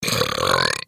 Kingpig Burp; bad piggies; android; mobile; windows

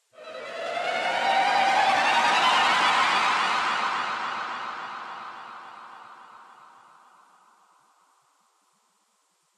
Combat String Start

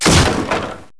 break wood

